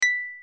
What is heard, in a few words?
right answer; vrcharades; unity